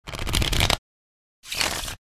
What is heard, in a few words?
Deck of playing cards riffle shuffle